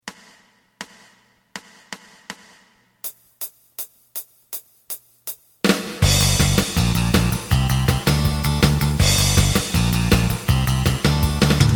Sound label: lead; エレキバンド用カラオケCD